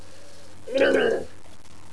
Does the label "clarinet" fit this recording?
No